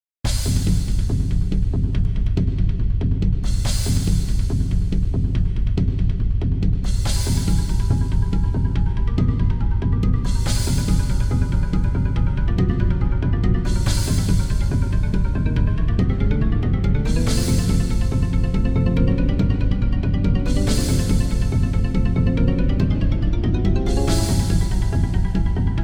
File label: palpitation